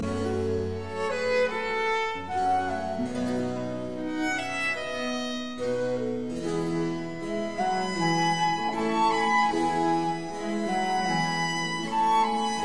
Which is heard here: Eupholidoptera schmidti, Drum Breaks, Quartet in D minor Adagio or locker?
Quartet in D minor Adagio